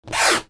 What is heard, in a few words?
FRICTION